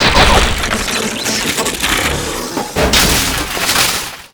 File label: metal process, factory; CosmicRage